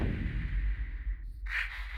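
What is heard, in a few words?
Music Volcano Light 120; From Dust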